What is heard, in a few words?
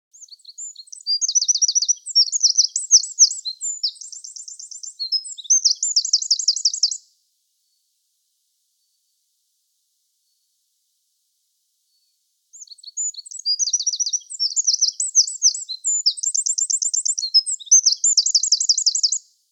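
wren voice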